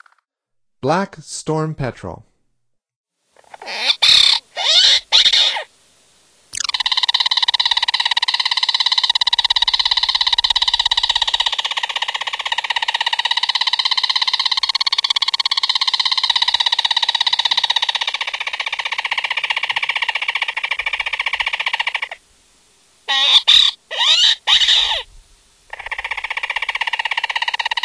Black Storm-Petrel, Songs of Birds of North America; Stokes